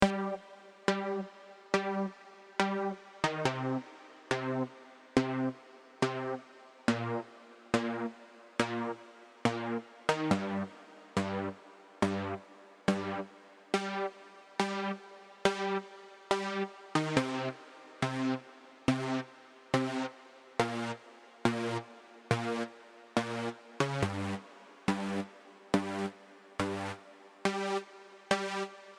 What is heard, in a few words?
Stab Test; MonoPoly